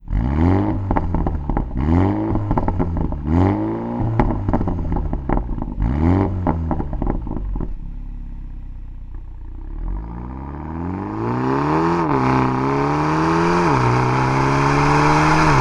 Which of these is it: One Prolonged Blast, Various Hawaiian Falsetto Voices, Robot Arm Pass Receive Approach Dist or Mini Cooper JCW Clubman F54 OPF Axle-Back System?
Mini Cooper JCW Clubman F54 OPF Axle-Back System